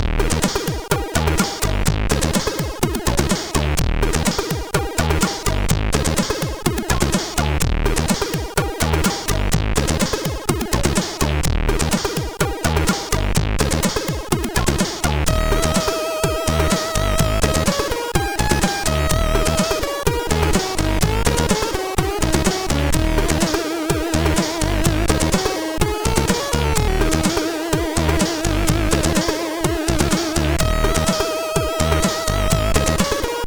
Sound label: Bass Spass, CSG8580R5; hvsc